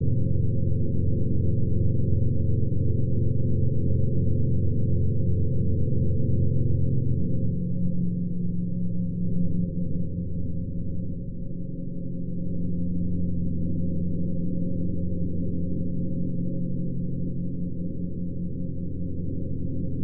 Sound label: electric gamelan ENGINE NOISE